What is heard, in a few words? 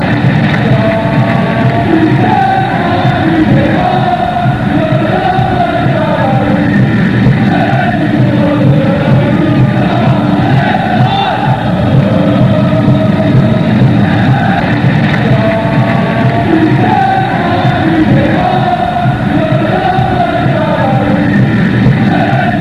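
capitaine, football chants; rai; PSG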